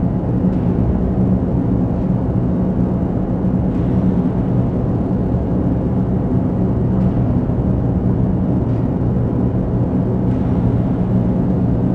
constructor engine

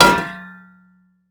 metal; Rust

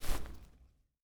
Footsteps Grass; DCS

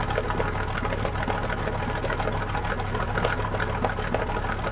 bomber cockpit, engines; War Thunder

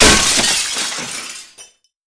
glass break big; Escape from Tarkov

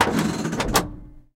transmission lever, tanks; War Thunder

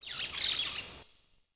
Jungle Bird; Ambient; Civ4 beyond sword

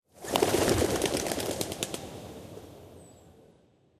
ambient port; World Of Warships; port3d

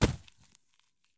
soil landing; Escape from Tarkov

